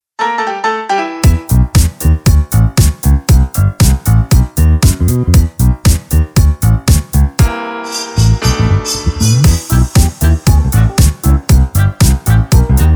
Night Walk, electro swing

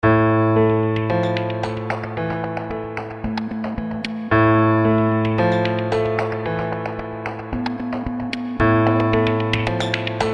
Sadness, sad

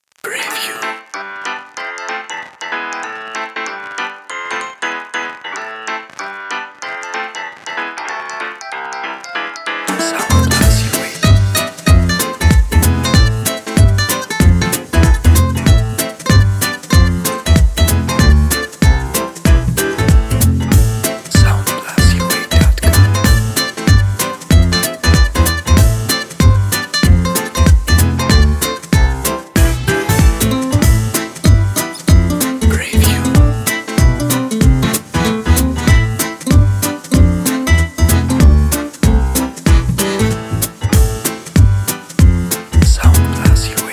Cheerful Electro Swing

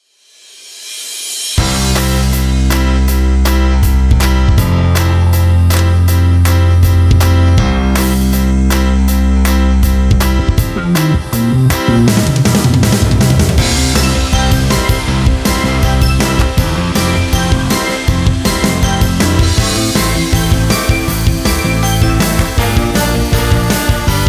UpBeat

